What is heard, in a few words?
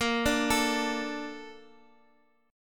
Bb Major 7th, Bb, down, Guitar, Open D